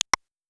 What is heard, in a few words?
click